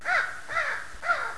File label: bird; XDM; ambience